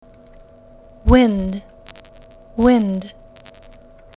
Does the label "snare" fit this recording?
No